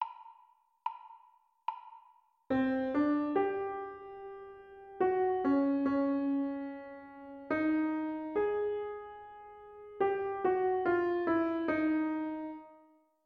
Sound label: melody